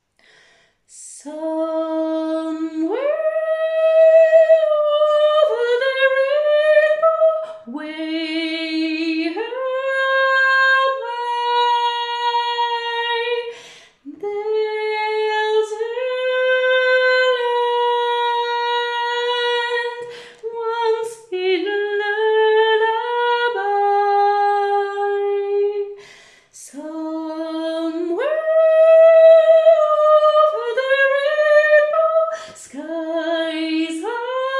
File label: soprano